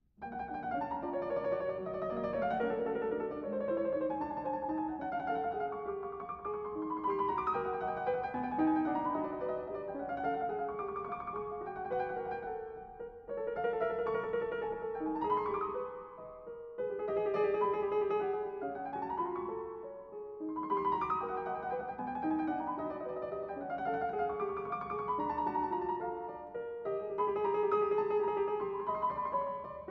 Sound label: Etude in F sharp major; scriabin